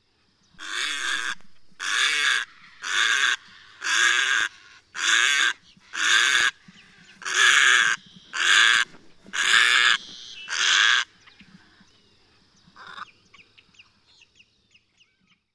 Little Blue Heron

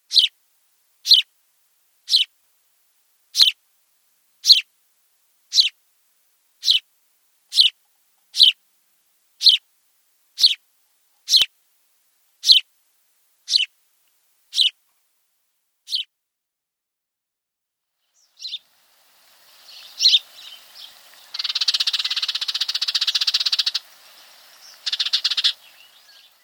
House Sparrow

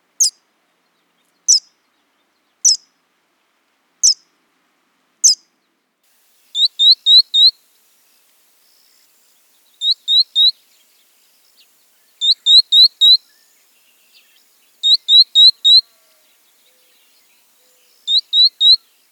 Verdin

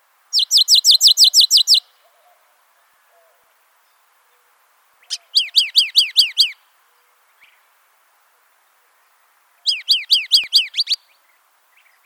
Canyon Towhee

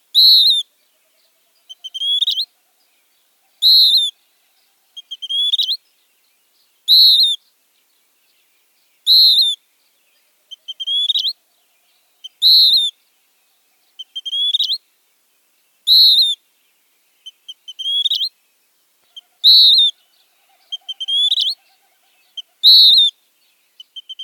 Western Wood Pewee

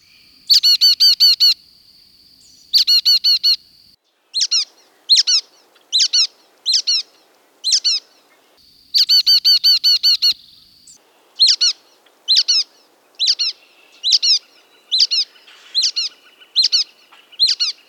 Brown headed Nuthatch

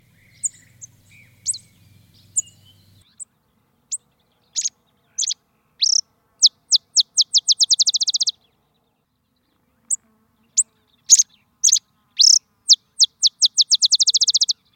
Botteris Sparrow

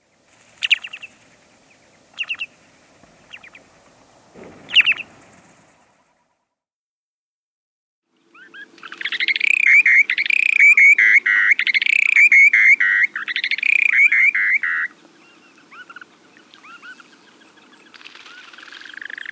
Short billed Dowitcher

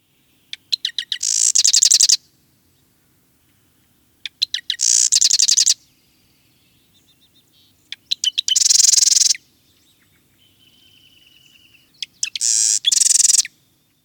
Marsh Wren Western

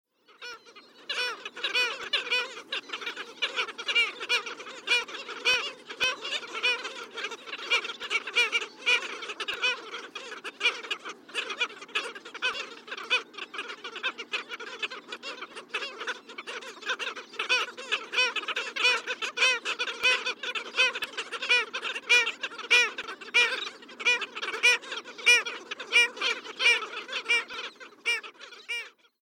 Black Skimmer

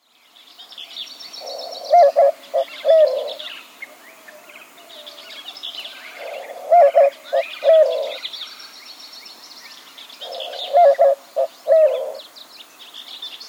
White winged Dove

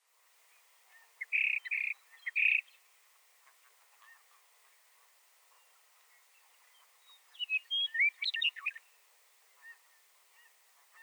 Western Meadowlark Sturnella neglecta

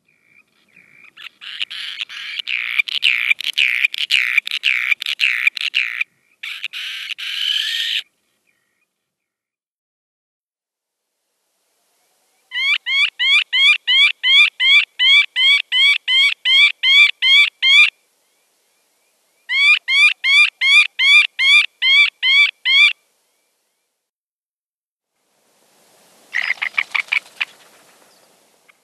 Surfbird

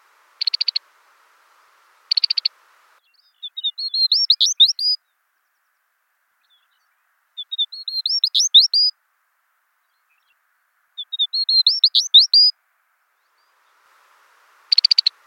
Smiths Longspur

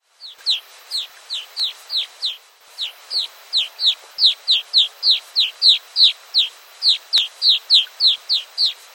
Brown capped Rosy Finch

